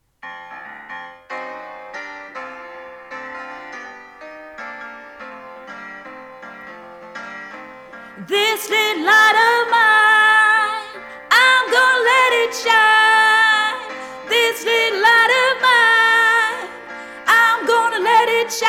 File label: This little light of mine - sopran